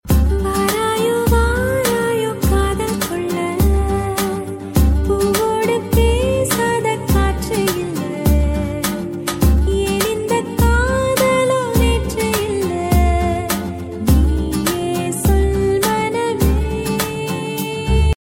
female

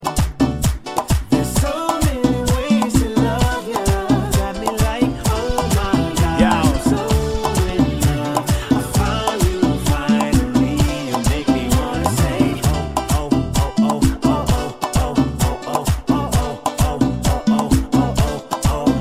merengue remix

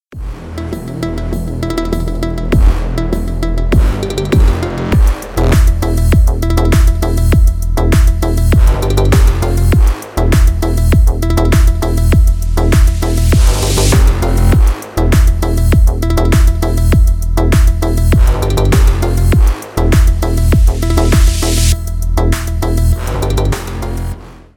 balalaika

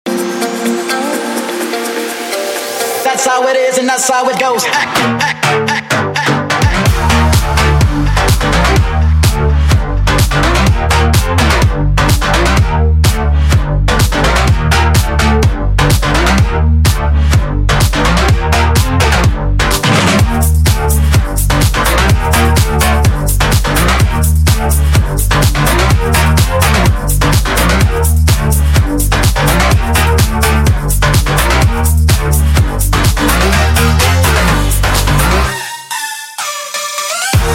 guitar track remix

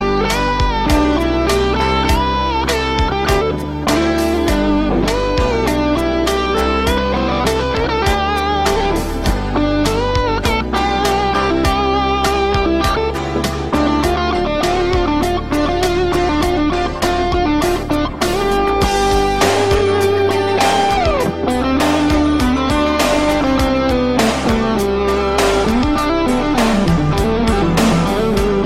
guitar cover

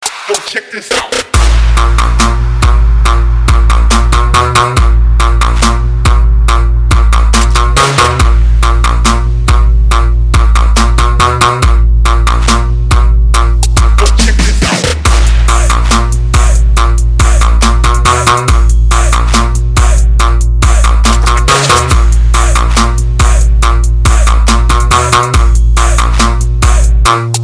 bass line

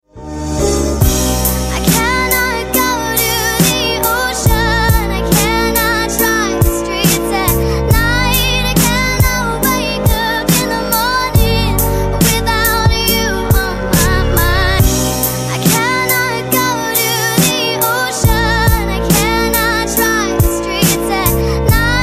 romantic summer

